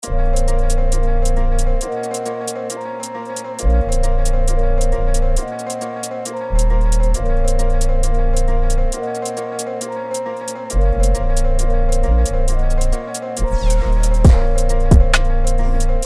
Drill Melody Loop A 135 BPM

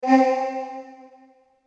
Vocals One Shot

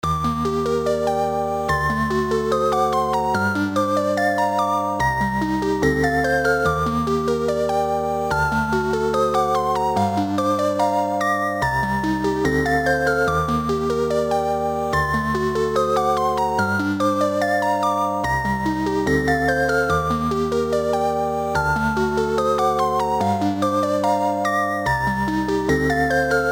Serum Key

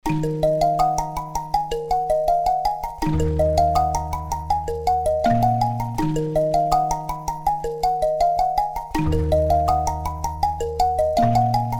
D min 162 BPM Plucks

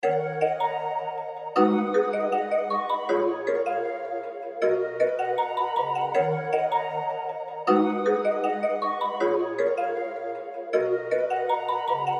D min 157 BPM Piano Keys

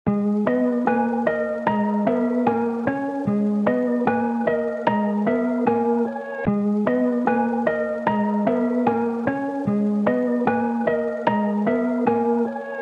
G min 150 BPM Pluck

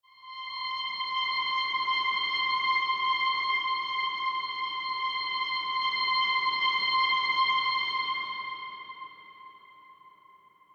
Pad One Shot

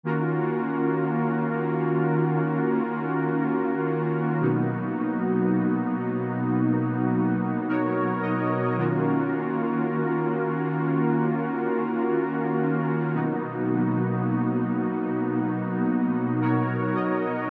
Afro Melody Loop Dmin 110 BPM String

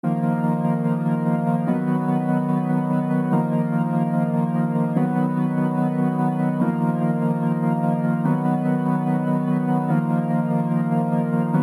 Drill Pad Loops Dm 146 BPM

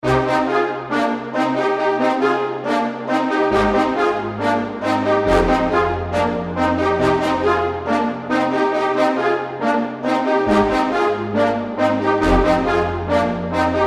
C min 138 BPM Brass

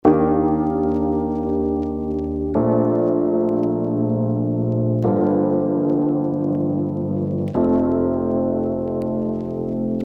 Lofi Piano Loop Am 96 BPM

